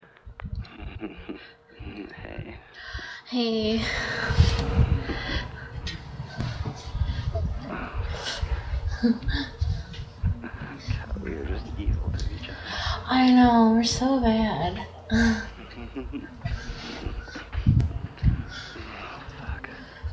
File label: M&F4A, Moaning, Wet noises, giggles, a little begging; A nice little release between us